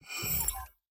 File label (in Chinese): 技能洗炼出结果音效; nba